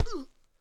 Punch

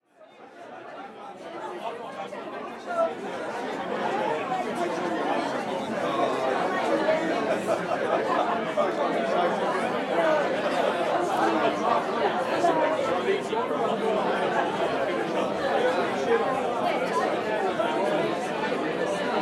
Club Chatter London

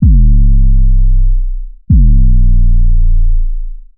Bass drop

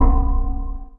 Stab

